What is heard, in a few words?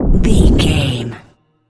bullet leave barrel slow motion